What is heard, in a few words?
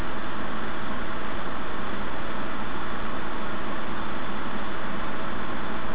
high rpm; antec